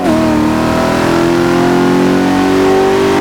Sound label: third, vehicles; f150 81